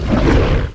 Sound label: blood attack